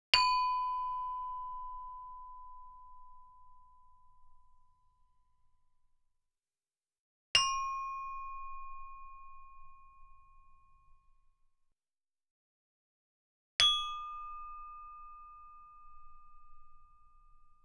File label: Gamelan; Propeller Island - Complete Gamelan